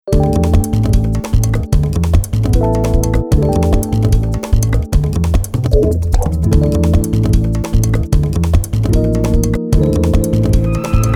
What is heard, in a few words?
pop track